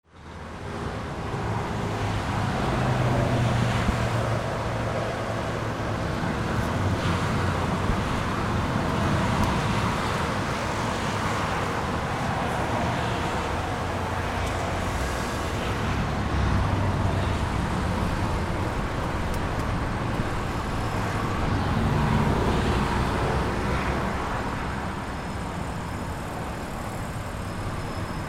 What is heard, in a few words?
AMBIENCE